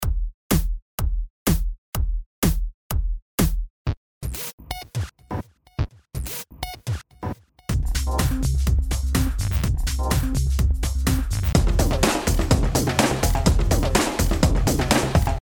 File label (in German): Triebwerk drum